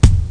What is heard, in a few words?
p bassdrum